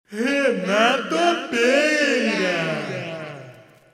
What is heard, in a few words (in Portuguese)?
VINHETA; os radiotas